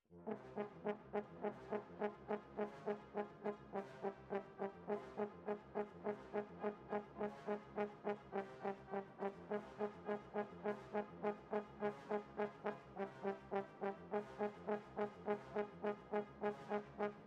Horns

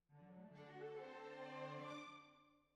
Cbasses

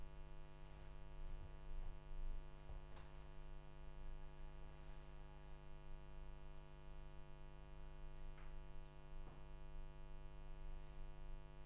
Room, Choir

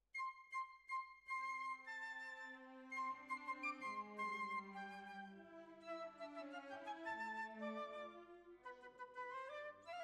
Oboe

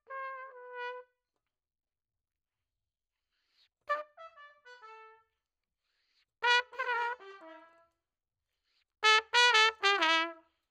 Trumpet

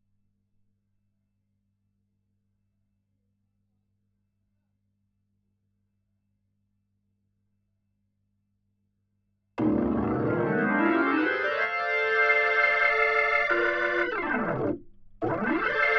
HAMMOND

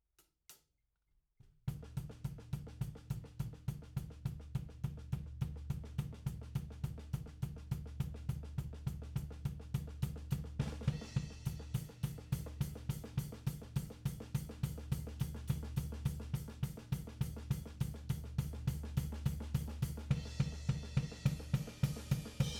Tom

